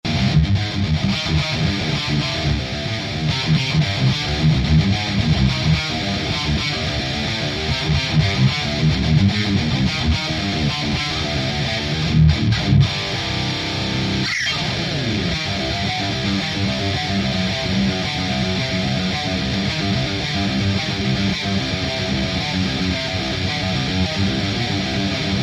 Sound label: Laboga Mark 4 Kemper scooped